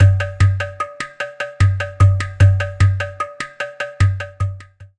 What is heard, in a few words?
ghatam